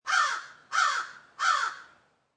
Crow Caw Multiple Short, A single crow caws three times.; Birds; Animals